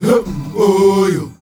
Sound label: MLEGRPCH, Heart of Africa, CHOIRS; Spectrasonics; Heart of Africa 2